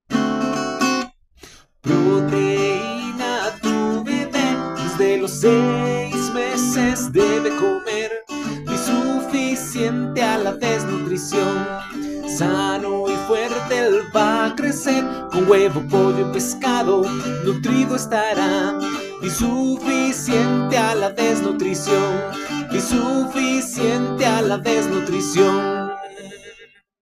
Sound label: Jingle